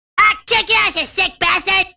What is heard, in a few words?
kick; Hacked Hot Wired and Channeling Cartman